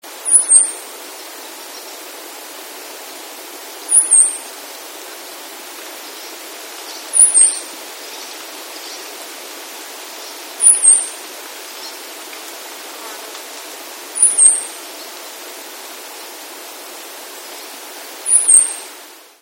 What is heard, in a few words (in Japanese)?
hojiro